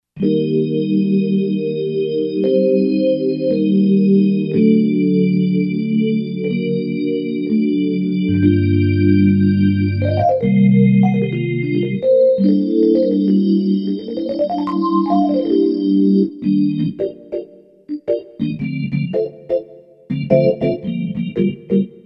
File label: TREM ORGAN, Roland SC-880; Factory Patches